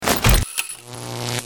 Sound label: wire bomb place; Original TK Sounds